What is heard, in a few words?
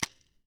vm from back, guns; vm from back clip; vm-68